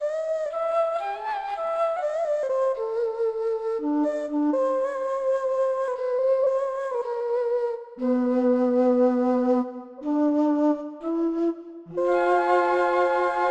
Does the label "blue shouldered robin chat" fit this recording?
No